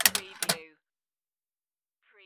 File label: Pinball sound, clanks slide and clank